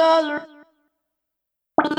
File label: Snapped, Vocal Samples, Sound Design; Sonic Foundry (Sony Creative Software); Pocket Diva World Class Vocal Samples